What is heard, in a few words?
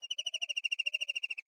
Amb Env Bird Chirp; Viewfinder; Botanist